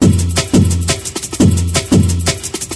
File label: fire, breaks; DOA breaks